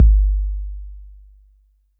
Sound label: Roland 808 BD